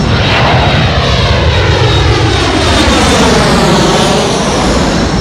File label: airplane jet Engine; SuperTuxKart